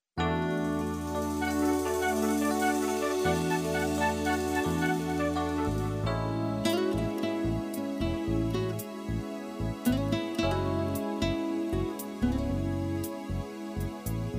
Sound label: new age